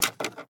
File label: Cartridge Fall; MiSide